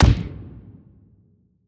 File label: Stab; portal quest android; Shield Minion Attack